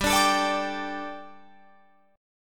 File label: G Suspended 2nd G, down, Guitar, Open F; Gsus2; strummed